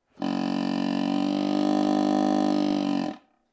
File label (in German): riesenstimme